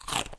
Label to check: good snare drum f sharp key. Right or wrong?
Wrong